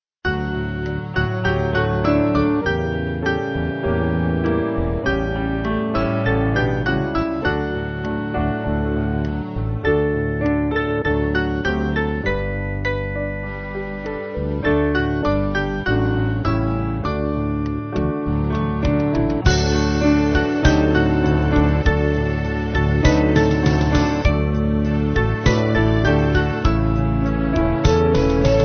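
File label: Band, Music Backing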